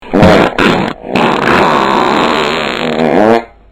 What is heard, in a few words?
fart